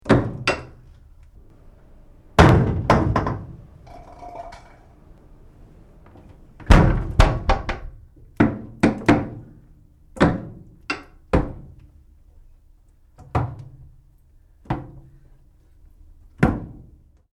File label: Metal Door Open and Close; Tom Hutchings